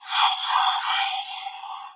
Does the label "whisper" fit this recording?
Yes